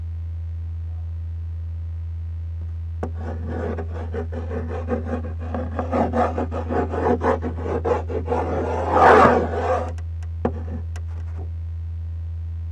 kuli plaste; Pistazie und Konsole

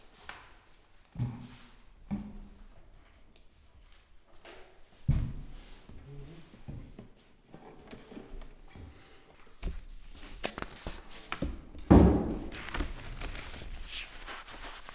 Nebengeraeusche; murb in Lichtenberg (Brandenburg)

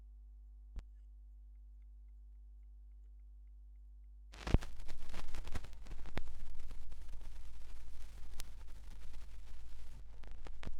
schallplattengeraeusche

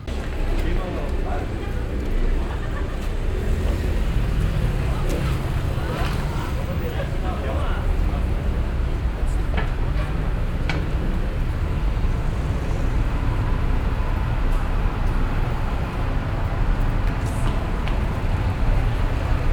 an einer ecke in sp, Brasilien

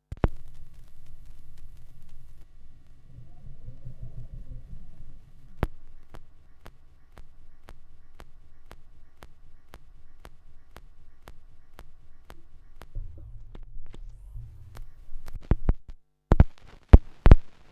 schallplattengeraeusche, Vinyl; wendelrekords 02

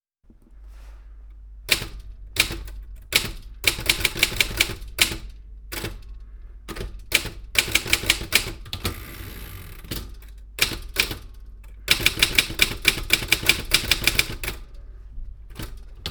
Schreibmaschine (Improvisation); O-Ton

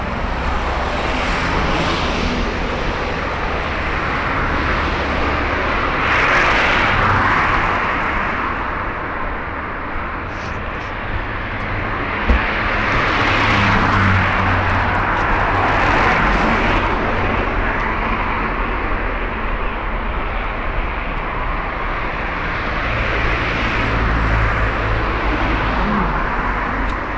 London auf der Strasse, Fieldrecordings

